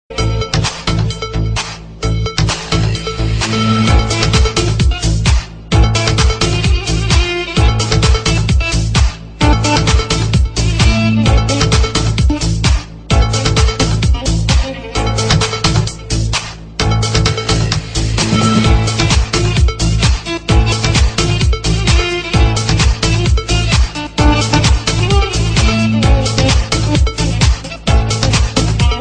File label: Arabic Electro